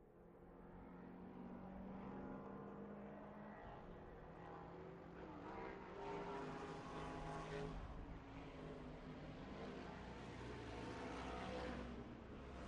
Vintage Race Car; Racing Ambience, Vintage Race Cars Cu Fast Bys R / L, Shot Cu From The Track; 1963-1966 Vintage Race Car